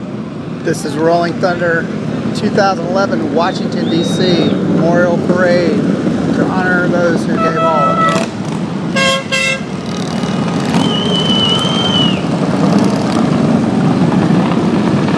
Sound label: rolling thunder